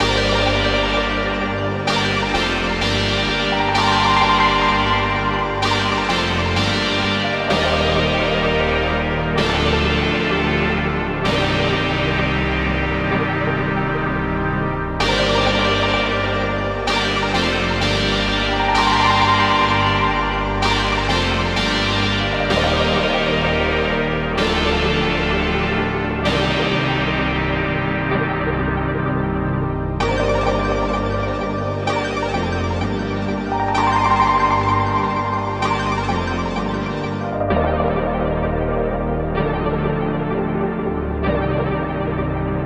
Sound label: 128 BPM G Min, Melodies Analog; Archangel; Cymatics; GHOST (Beta Pack)